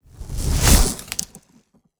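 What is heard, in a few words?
shock charge, magic, lightning; skyrim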